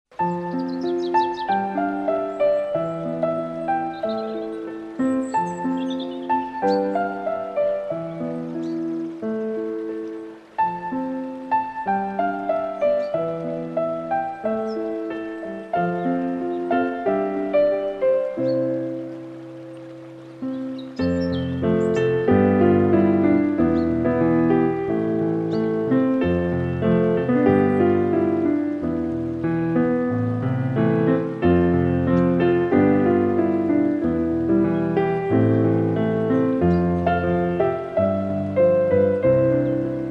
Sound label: Nature Music